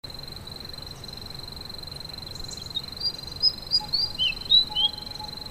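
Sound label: White Throated Fantail, vietnam birds; Ong Le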